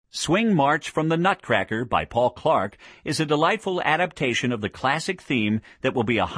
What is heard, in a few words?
Swing